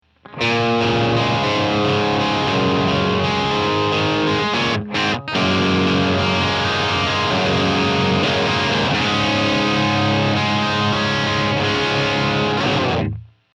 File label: placater dirty